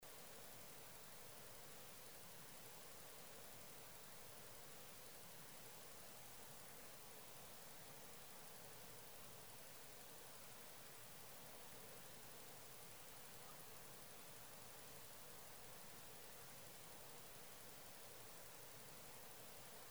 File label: Sage Thrasher; Sage thrasher songs, through the night.